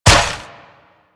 step default; Warframe; Infested; Zealoid Prelate

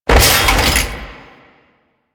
Parry Finisher Fall; Enemies; Finishers; Dax; Warframe; Duviri

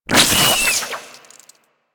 Infested Face Hugger Detach; Warframe

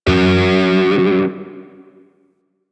Grineer Shawzin Flourish Oct One F Sharp; Music; DE; Warframe

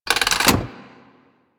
Rolling Drone Turret Turn

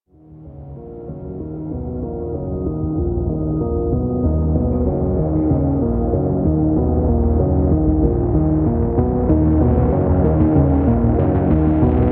Amb Cue, Music; Warframe; DE; Hubs; Syndicate Perrin Sequence; Tenno

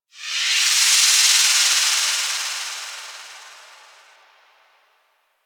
Energy Thread Curve One Off; Warframe; Apostasy

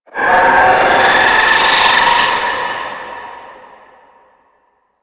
Raptor Spawn Scream; Warframe

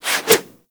Zipline Brake Foley; Gadgets; Parkour Zipline

